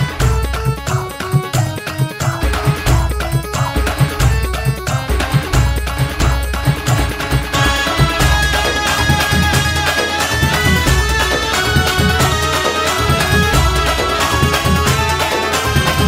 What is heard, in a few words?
Marathi Ringtone